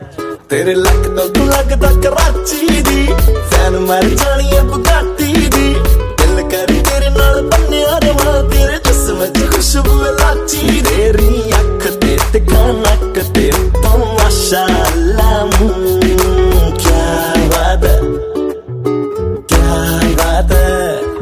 Punjabi Ringtone

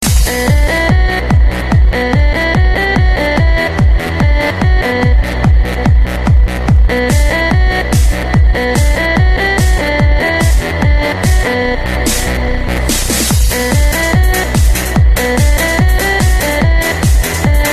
Zombie Nokia

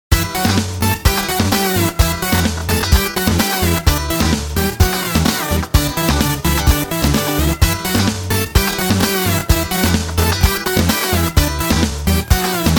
Instrumental Tone; Yaariyan

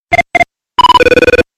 Basic Sms

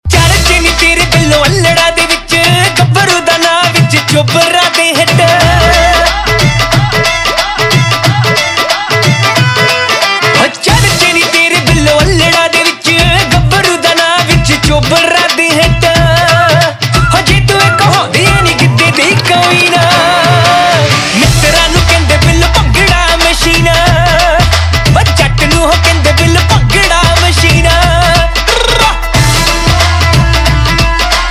Bhangra Ringtone, Punjabi Pop

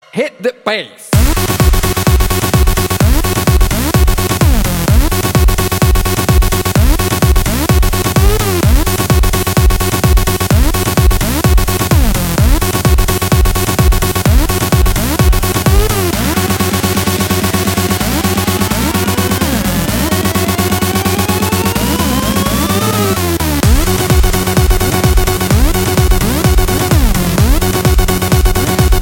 Hit Da Bass

